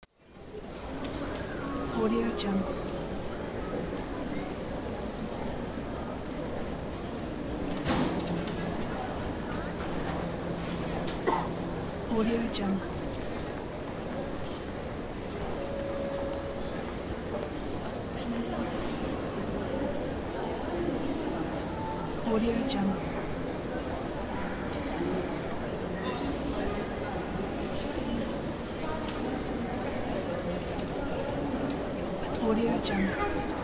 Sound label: airport ambience; spacelabstudio